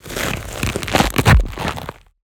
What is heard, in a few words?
creature crab organic texture carapace crack movement; Bluezone Corporation